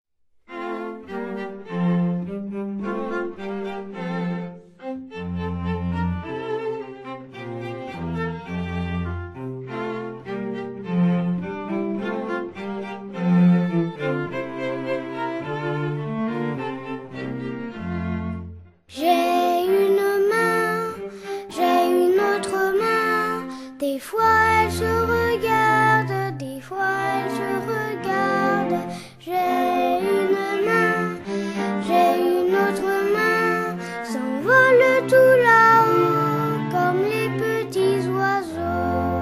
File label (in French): main chant